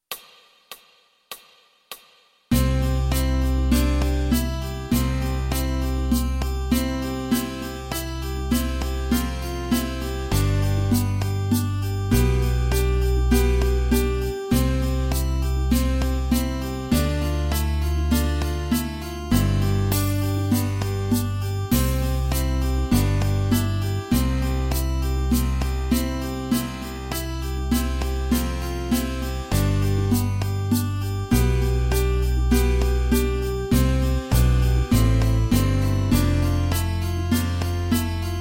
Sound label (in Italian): Tastiere